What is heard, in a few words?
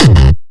bass kick